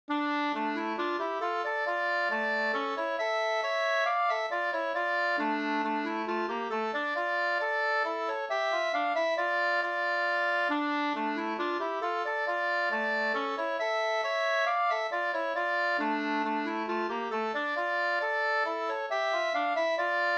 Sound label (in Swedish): mazurka Svantes mazurka Tornedalsmazurka